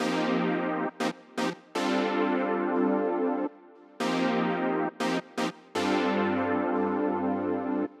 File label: Synth 120bpm Cm, Nu Disco; Zero-G; Construction Kits; Legacy